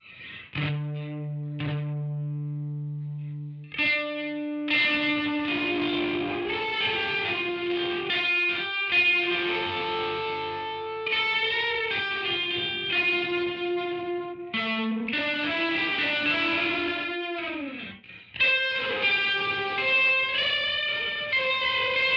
crunch

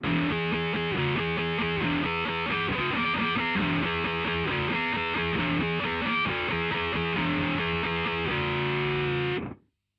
shift e disto

